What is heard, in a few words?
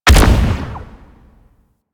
Note Projectile Launch; Warframe; Bard Quest; Bard Boss Fight